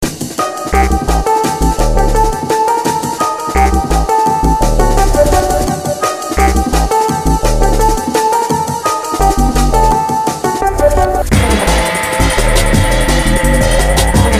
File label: Bouncing